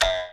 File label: Button Tap; RunnerSanta2019; UltimateKit